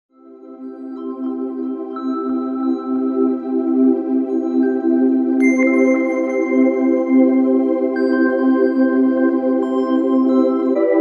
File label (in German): Meditationsmusik